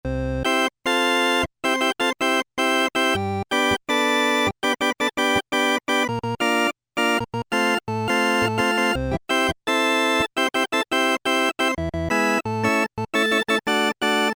British Organ, Korg MS2000; Factory Patches

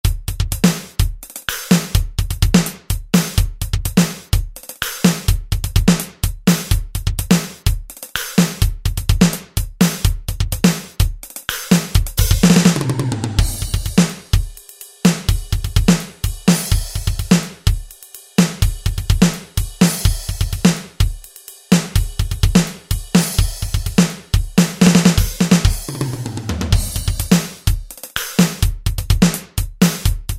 Fusion, Alesis SR-16, preset patterns

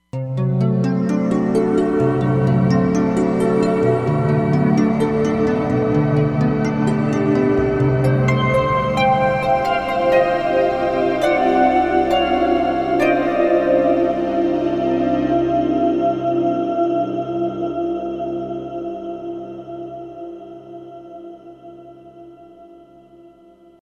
Story Harp, Roland Fantom XR

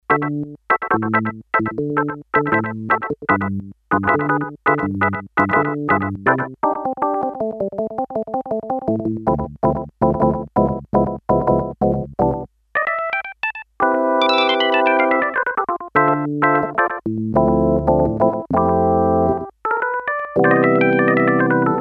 Organ, Korg DS-8; Factory Patches

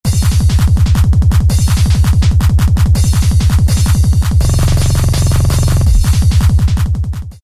Trip Hop Sound Effects, Roland MC-303

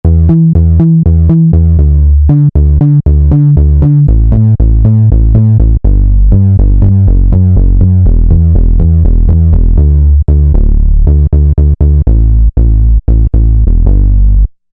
Hood Bass 2; Roland SRX-05 Supreme Dance

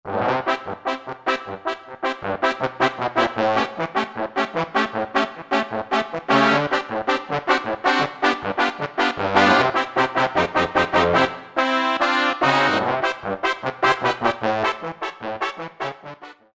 Trumps & Bones; Roland SRX-03 Studio SRX

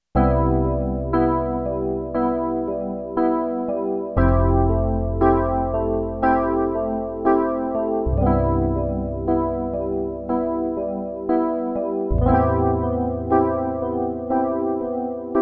Piano, Roland JX-8P

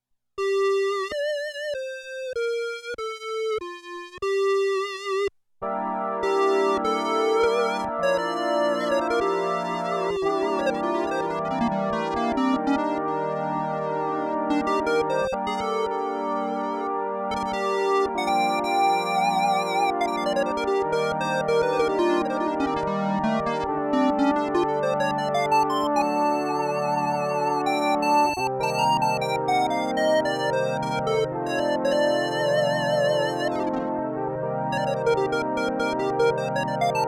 ENSEMBLE & SOLO; SIEL DK 80; Factory

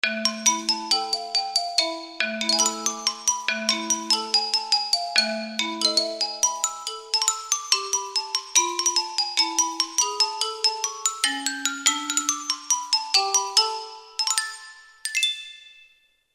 Xylophone

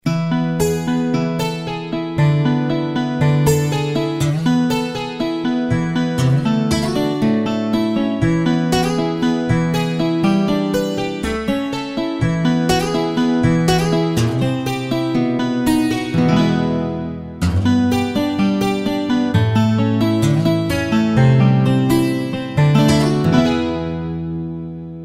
Twin Strings; Roland SRX-03 Studio SRX

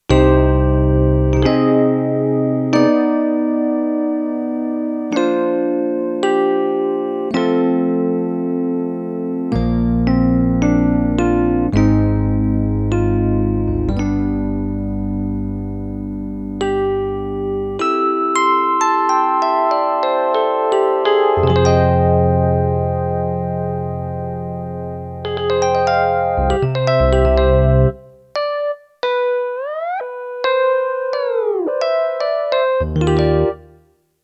Classic E Piano, Kurzweil K2500X; Factory Programs

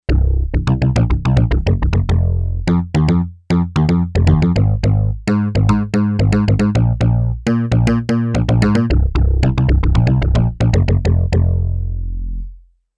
Tight BASS, Yamaha DX11; Factory Performance Voices

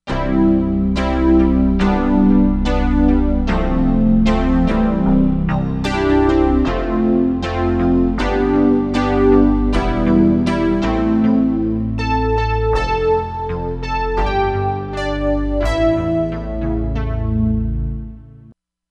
Layered, Korg MSC-07S Synth; Korg MSC-07S Synth 2

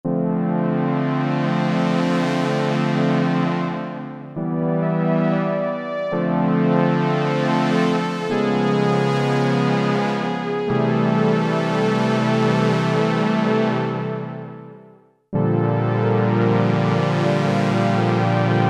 SWELL HORNS, Oberheim OB-8; Factory Patches

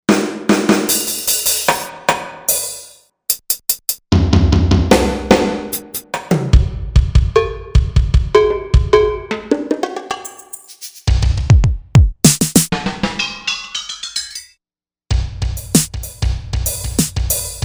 Drum Kit, Korg M1Rex; Factory Patches

